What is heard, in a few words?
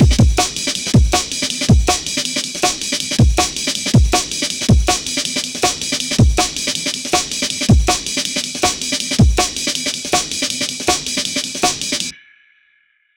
jungle, breakbeat